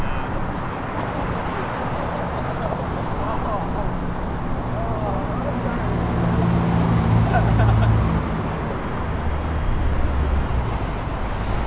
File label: University Ave Background Noise